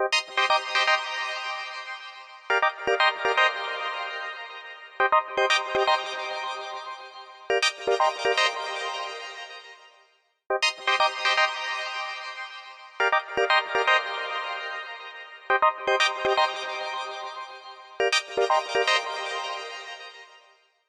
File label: Chic Oasis, ringtones; LG; Wing 5G SKT